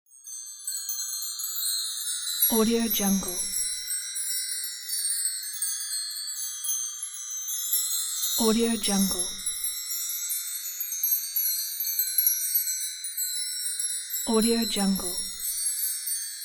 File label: wind chimes